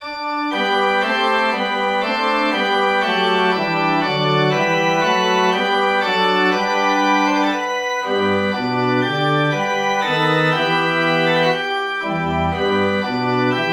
forspil

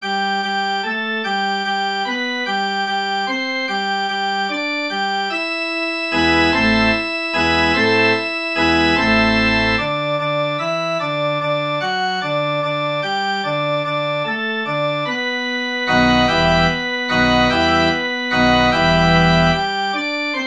forspil; kom, regn af det høje!

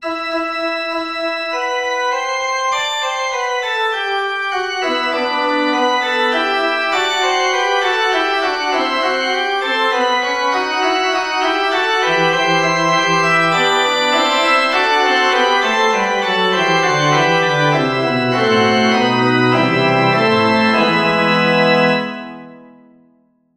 forspil

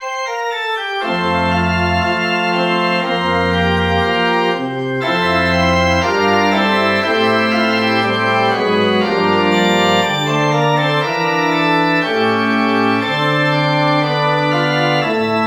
forspil; 839 sov du lille

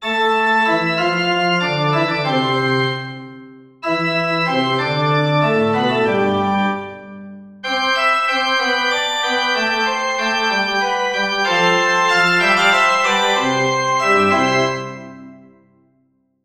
forspil

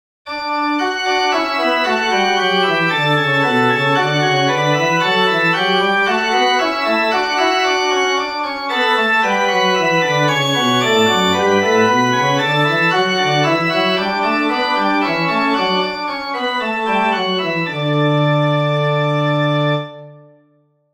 forspil

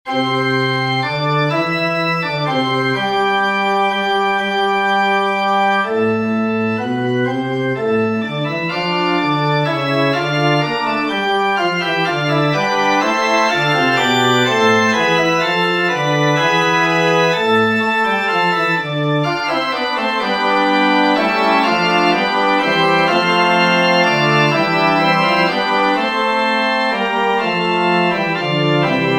forspil

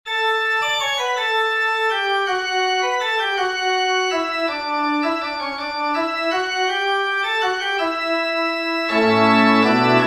forspil

